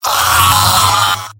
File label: pain severe; engineer; tf2; mvm